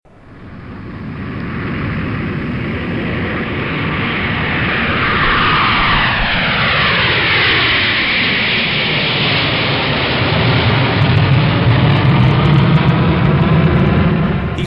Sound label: air noise; noise pollution